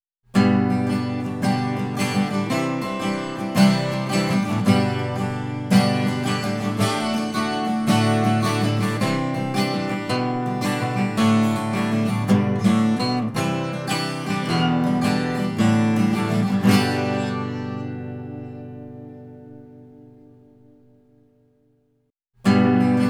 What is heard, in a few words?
Necklace Guitar